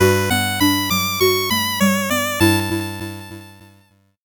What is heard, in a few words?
8bit jingle normal